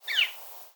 Animals Small Bird Sound

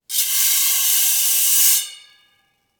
Misc Metal Rake On Concrete

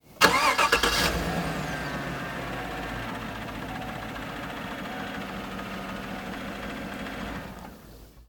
Vehicles Truck Starting Pickup Truck

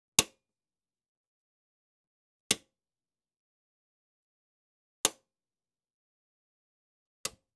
Switches and levers Metal Box Toggle Switch

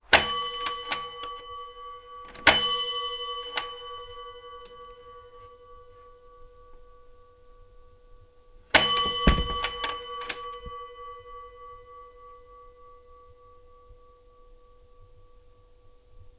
Doors and drawers Office Front Door Bell; Fischer

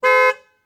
Vehicles Horn Saturn Aura Horn

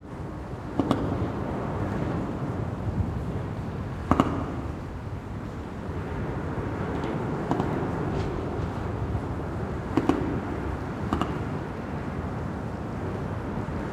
Traffic Under Highway 680 Bridge

